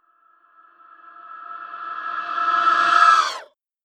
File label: wraith hit reverb; schreie